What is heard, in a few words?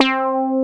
res synth; FUNK